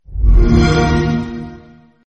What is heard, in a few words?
Xbox One music notification alert